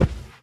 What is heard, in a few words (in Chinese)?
熊猫脚步声